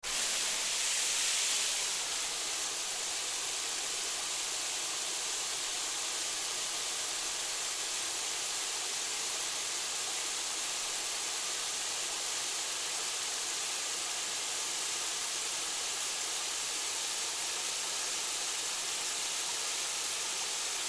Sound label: Water Hose Spray Cone Setting; Spraying; Blastwave